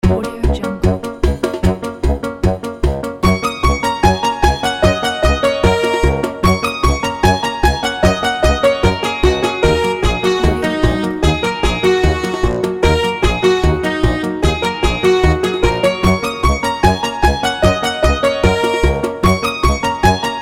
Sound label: chinese funny comedy